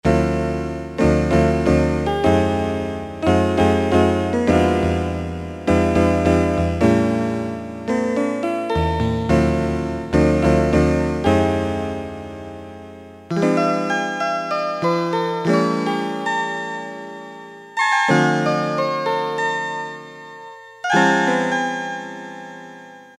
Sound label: Elec Piano; Tones